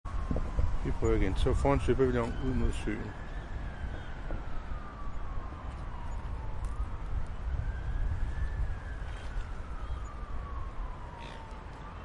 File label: city lake semi distant traffic ambulance birds peblinge